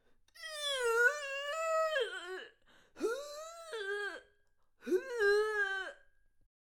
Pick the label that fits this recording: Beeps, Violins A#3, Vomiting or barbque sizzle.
Vomiting